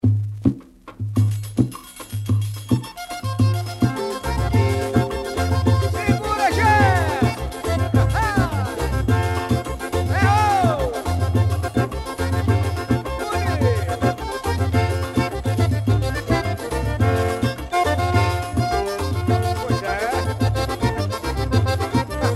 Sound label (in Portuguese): Forro; Encabrestado